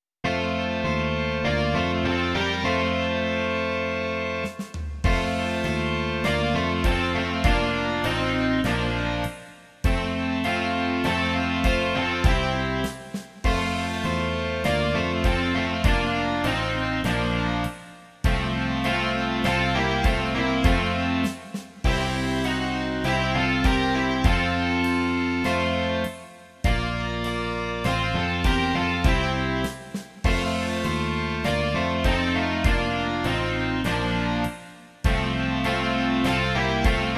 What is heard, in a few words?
rock